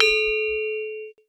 gong A, keromong

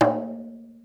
rebana tak

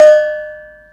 canang anak

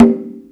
gedombak anak ting

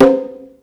gendang ibu

